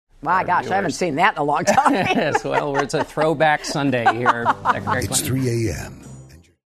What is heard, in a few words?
LAUGH; hillary